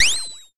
kyupiin

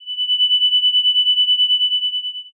shiin

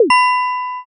shishiodoshi

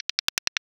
gichigichi

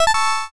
doya

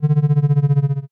wanawana

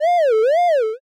mojimoji

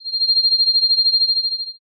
shiin

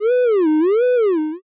mojimoji

